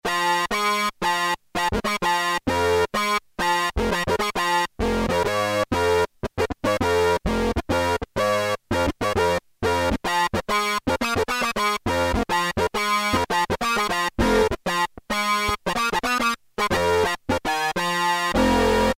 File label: Sync Wah, Roland JX-3P